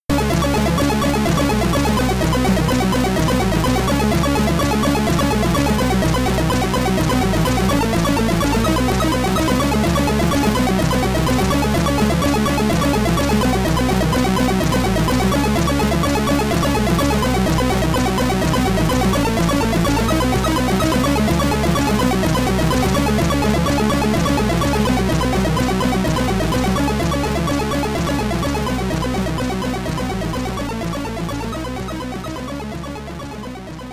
Boss SID Stereo; Gemini Wing; Commodore 64

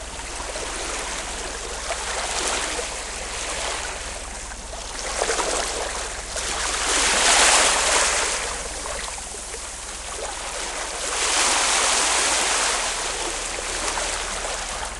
Amb Beach; Jack Axe; macOS; Windows

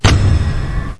mission leak

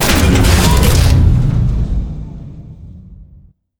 Boss Machine Start; helltaker

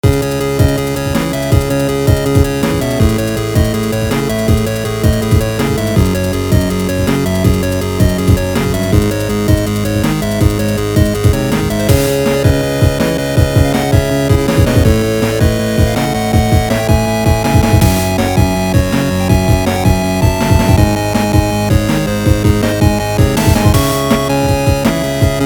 8bit; Barrage Musical A Fantasy of Tempest; Windows

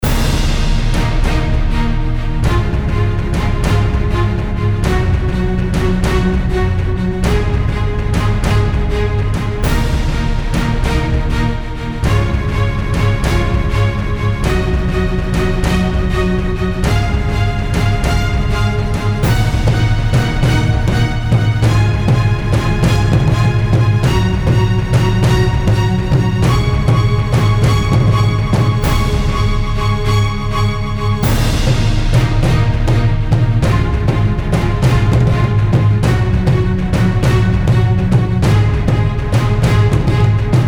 Cinematic Trailer; Air Guardians; Windows

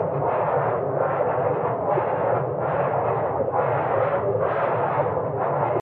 Frost loop

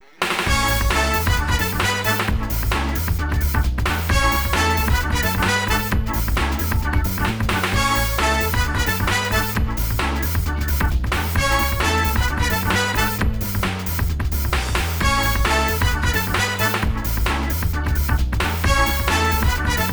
Race Won; RC Revenge Pro

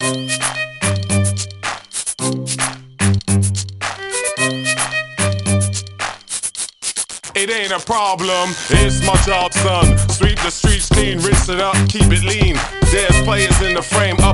Vocals; Clique: The Diss and Make Up; NDS

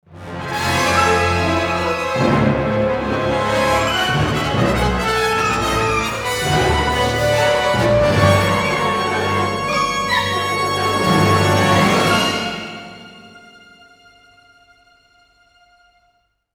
Battles Rebel Lose; Hoth

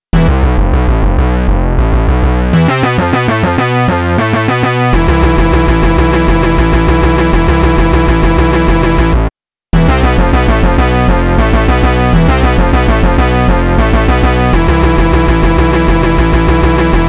drum game track backing; horace ps4 switch windows xbox one gamerip 2019

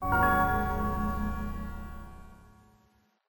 win sound

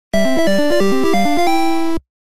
Bonus Start; Alleyway

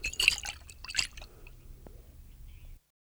Swig Water Bottle; Lucius III; pc linux

